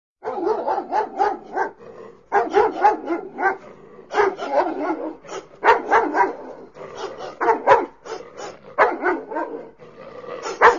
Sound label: Dog Aggressive Barking